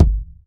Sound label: rich low end kick drum single shot g sharp key